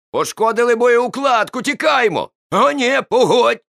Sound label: ammo bay damaged